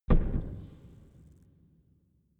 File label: gun pop gun pop